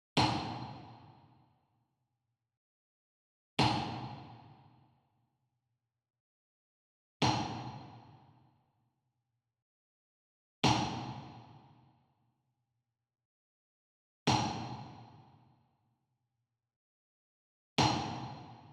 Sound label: Wood Percussion Wood Block Traditional Small Resonant Hit Far; boom library; Cinematic Strikes Construction Kit